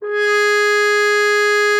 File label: G#4, FR HR MUTE; Xsample VOL-3